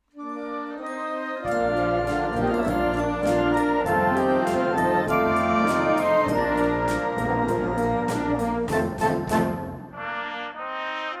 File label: Moody Trumpets